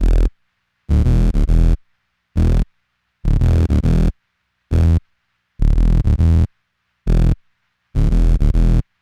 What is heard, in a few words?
Hard HipHop Basssynth Emin; Digidesign; Pro Tools Essential Loops